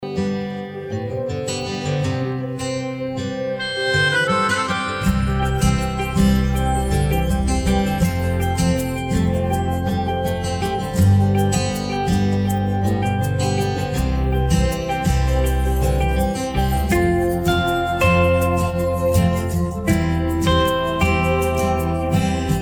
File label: Karaoke Song